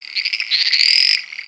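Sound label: Pets Insect Large Angry, creatures; Lego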